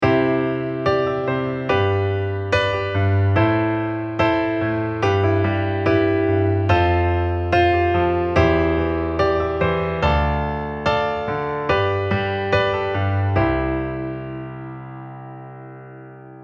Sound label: add9, accompaniment technic